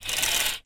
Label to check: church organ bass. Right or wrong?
Wrong